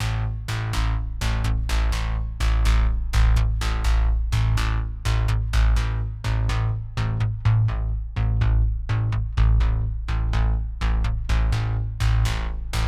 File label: 125 A Synth Loop; Hunter